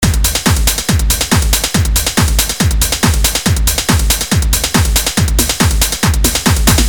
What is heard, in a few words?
hard drum